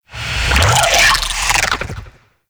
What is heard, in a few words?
Sentient Vocal Attack; Warframe; Sentients; Dolicholyst